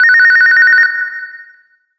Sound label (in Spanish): Generico normal, ringtone